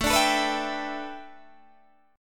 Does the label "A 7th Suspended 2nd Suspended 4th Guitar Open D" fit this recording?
Yes